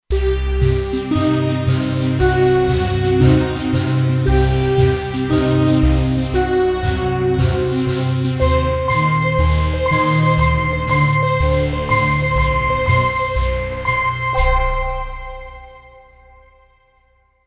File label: Kurzweil K2500X; Factory Setups